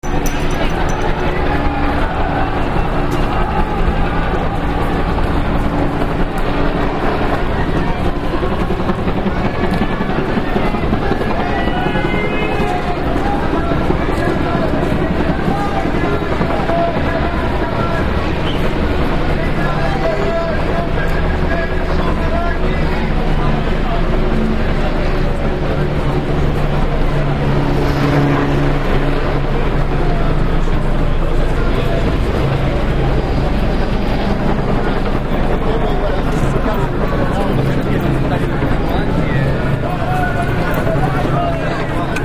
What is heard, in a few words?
demonstration, helicopters; the situation is dense, gas all over the city; there we also meet people of the publix theatre caravan. after a while we depart for the demonstration. the situation is dense, gas all over the city and helicopters permanently s; bordersounds